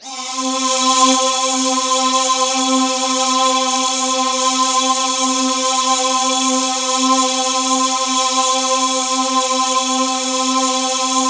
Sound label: C2, Synth Line; 90 s Sample CDs; NiceBeats; SynthLine VOL-31